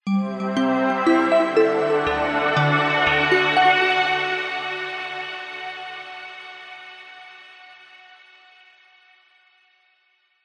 multimedia musical logo ident warm positive arpeggiated